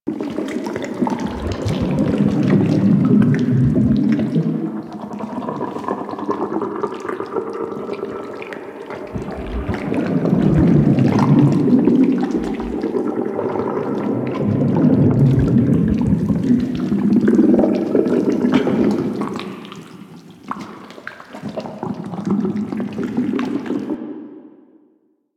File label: Cyst Loop Large; Ambience; Props; Infested Eris; Microplanet; Warframe